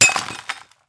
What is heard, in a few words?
glass Shat, CRNK